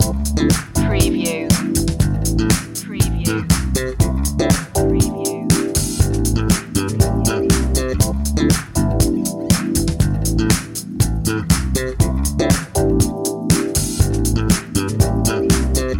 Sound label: funk loop